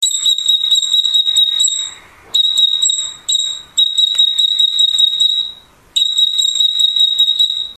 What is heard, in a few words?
blackbird call